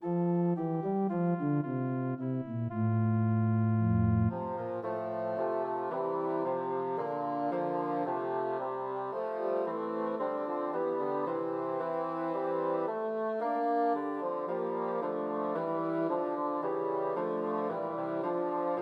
While Shepherds Watched Christmas Trios for Three Bassoons